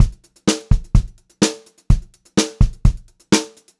126 PO5, Pop-Rock Drumloops

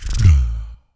VOC PERC, Vocal Planet Jazz FX BASS PERC

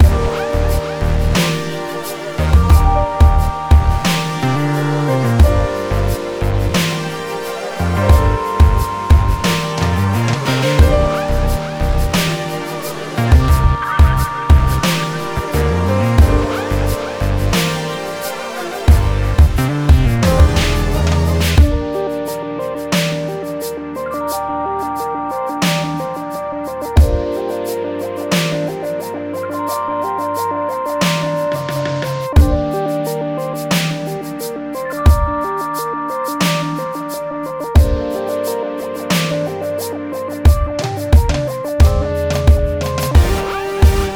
089 Gmin, Smooth RnB; Big Fish Audio; Legendary; How Can I

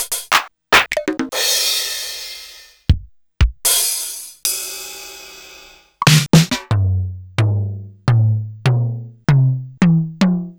RSF DD-30

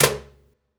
Cajun, Percussion One Shots; Essential Percussion

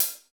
CYM 14, Real HiHats, 14 Hi Hat

